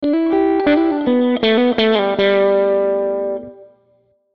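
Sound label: Musical Element, Drum Snare March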